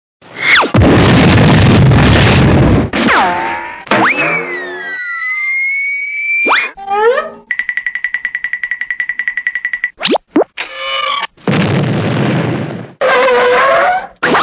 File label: cartoon sound effects